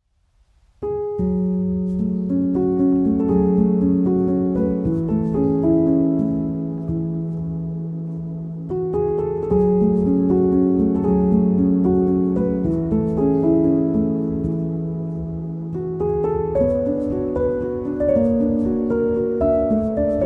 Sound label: Instrumental Bikalam